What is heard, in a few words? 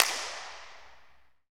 PRC RVB C, Clap; Rhythm Section; Clap & Snap; Clap Modules